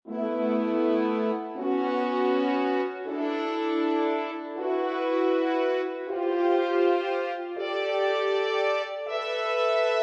Frnchrn phrase Dm, French horn fade in loop Dm 160 bpm.; Woodwind Loops